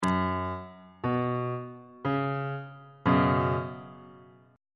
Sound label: b suspended 2nd 2nd inversion on bass clef